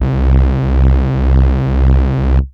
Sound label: Techno leads, hits and riffs